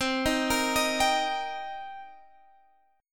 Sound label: C Minor 7th C Guitar Standard; Cm7 arpeggiated; JGuitar